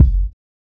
Hiphop kick; Reverb+EQ+Comp+Limit

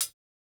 hihat, house

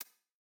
Modern hihat, Electro

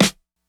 snare; funk

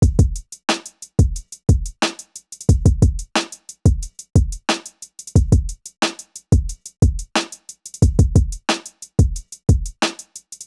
90s hiphop loop 90; Reference beat bpm90

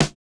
snare, snare house

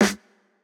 Jrock snare

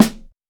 Rock snare; Reference Beat

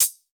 hihat, house

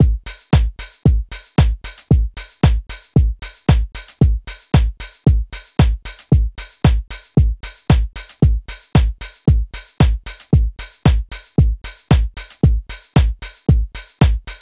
Modern loop 114, Electro

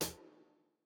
hall resonance hihat

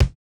kick, house

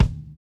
kick, house

